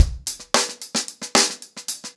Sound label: Hip Hop 110BPM, acoustic drums drumloops